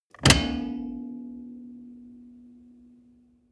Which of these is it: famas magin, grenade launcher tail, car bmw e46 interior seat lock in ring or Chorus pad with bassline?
car bmw e46 interior seat lock in ring